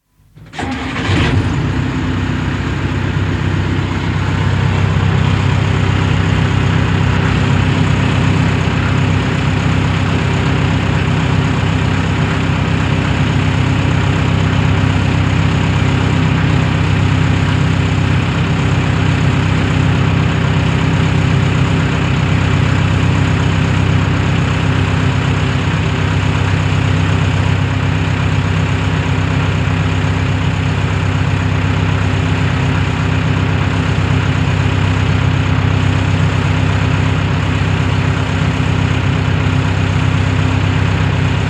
Interior,start, tickover, switch off (2 ton diesel truck, make not known); Truck Sound Effects; BBC